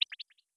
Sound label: Ready; blue archive